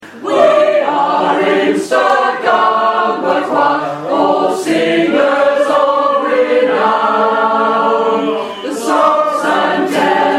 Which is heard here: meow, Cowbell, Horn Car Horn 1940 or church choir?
church choir